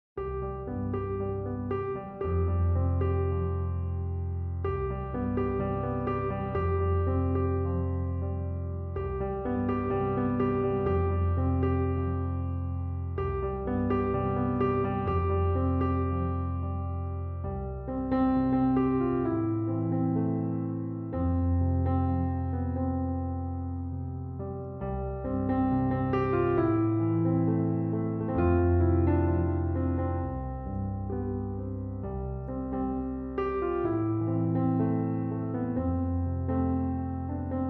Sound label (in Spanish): musica relajante; videos de naturaleza impresionante drones vista; relax